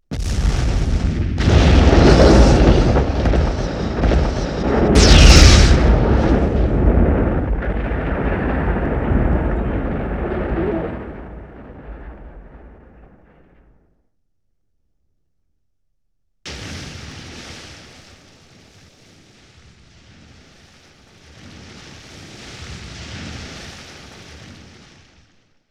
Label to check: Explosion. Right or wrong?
Right